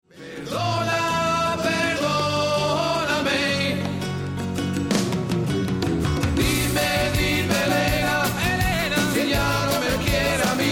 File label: gipsy voices